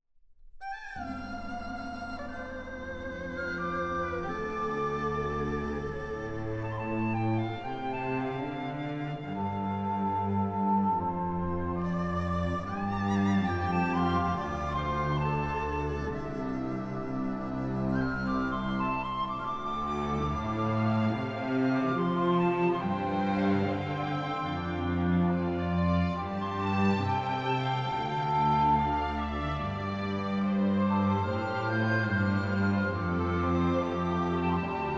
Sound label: Music; Age of Empires III; cinematics